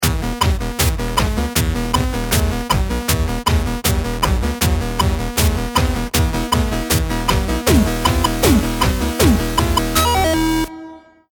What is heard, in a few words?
round ten sec count